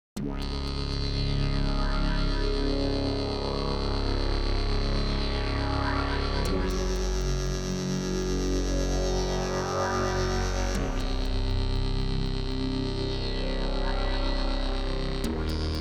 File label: kawai k3m